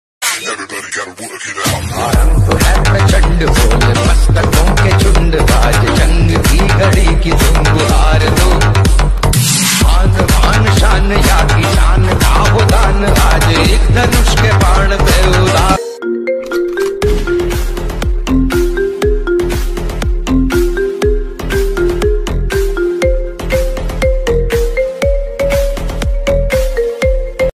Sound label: Marathi ringtone attitude ringtone bgm